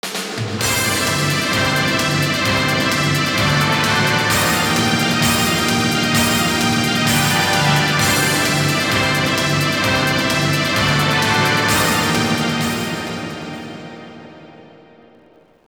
Str G#

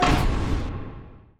UI Counter

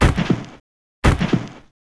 Golem Walk; Knight Online

